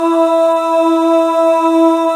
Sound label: Male Ahz; 90s Sample CDs; Club-50 - Foundations Roland